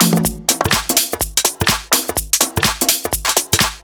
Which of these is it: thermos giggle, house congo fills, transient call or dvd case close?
house congo fills